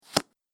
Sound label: Cards Card Placed; Card Sound Effects; casino sound effects